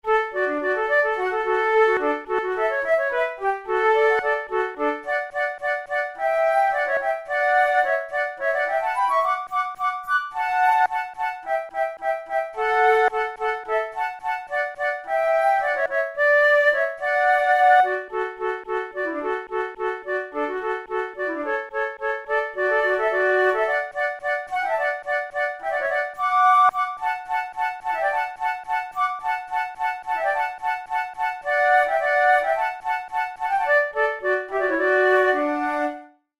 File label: air from the overture duet, flute